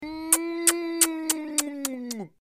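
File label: cartoon kiss sound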